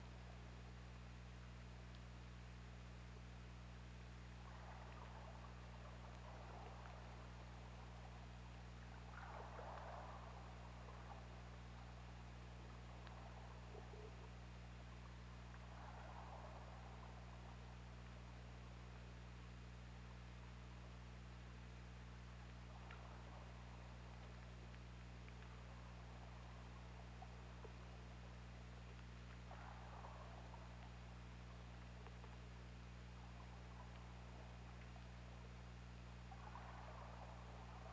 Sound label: Orca; OrcaLab